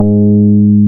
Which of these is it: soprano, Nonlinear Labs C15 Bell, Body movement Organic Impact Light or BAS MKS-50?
BAS MKS-50